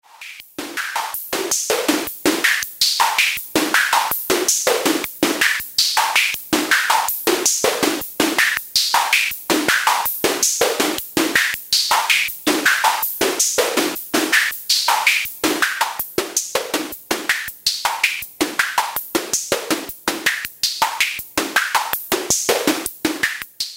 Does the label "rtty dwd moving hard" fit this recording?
No